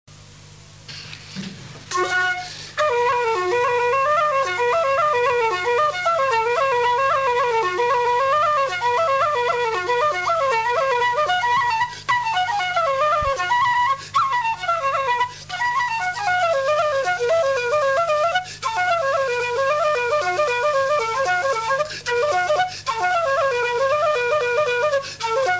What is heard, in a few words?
flute